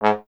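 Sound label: Brass Trombone G#0; Miroslav Vitous - Solo Instruments VOL-1; 90 s Sample CDs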